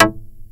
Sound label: TURBO ACID